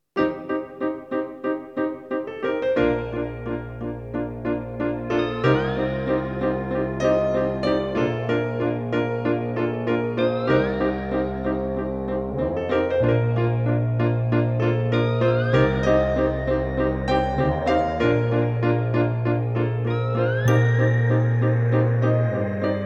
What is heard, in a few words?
music for piano guitar